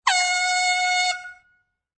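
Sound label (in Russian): туман флейта рог рог рог свисток уведомление уведомление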